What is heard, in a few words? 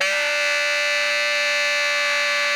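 Tenor growl D5, saxophones; Greytsounds; Philip Wolfe David Crozier's Saxophones; TENOR SWITCH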